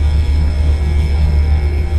blast charge

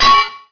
Glass Tk

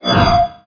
HEALTH; Marisa Kirisame

